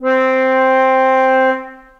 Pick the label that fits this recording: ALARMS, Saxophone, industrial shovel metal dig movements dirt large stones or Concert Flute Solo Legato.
Concert Flute Solo Legato